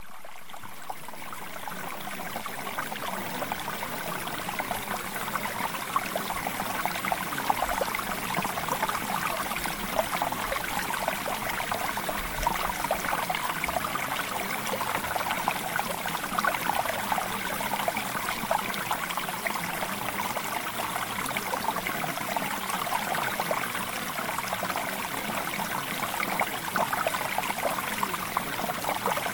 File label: Mountain Stream